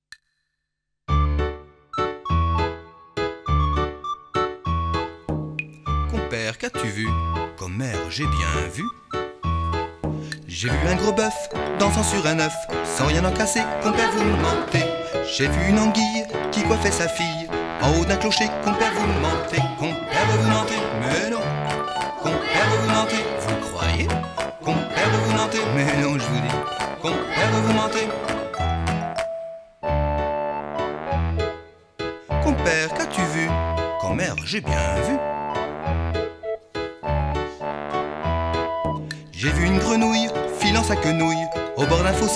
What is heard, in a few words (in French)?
ensemble vocal variété jazz